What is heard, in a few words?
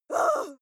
female lunatic shout short soft; schreie; menschen